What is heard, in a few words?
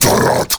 Cast Vocal; Warframe; Uriel; Remedium